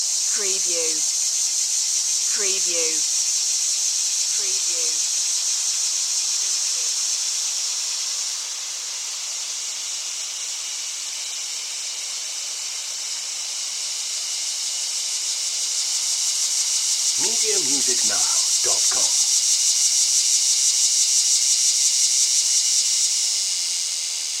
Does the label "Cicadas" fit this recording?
Yes